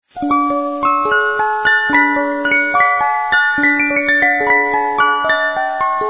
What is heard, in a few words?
Reuge; waltz